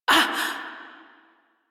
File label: pain, female; Railjack Crew; Steel Meridian